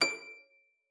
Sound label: C#7, HARP; Xsample VOL-8; HARP P+MF